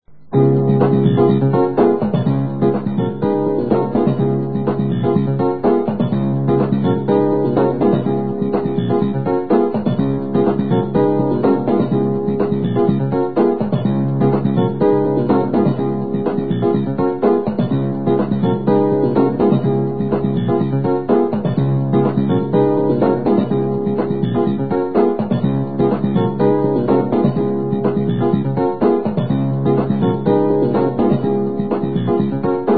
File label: Riff, Piano; Piano diary 2000